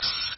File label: spray; Picture Keyboard